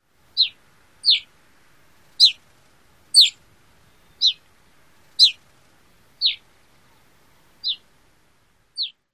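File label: call, Gray-crowned Rosy-Finch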